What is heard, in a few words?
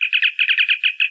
communicator, technology federation